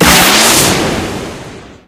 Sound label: spell blast jump; halloween; video games; source engine; tf